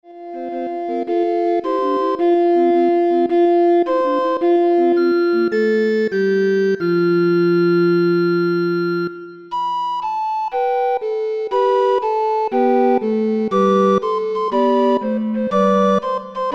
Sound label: Recorder Ensemble